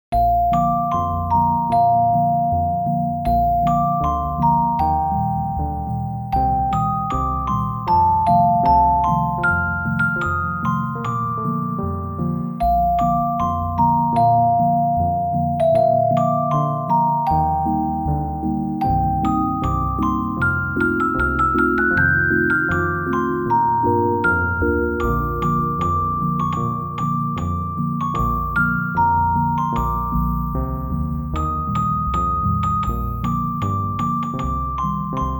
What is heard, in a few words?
Jingle Bells Carol Orgol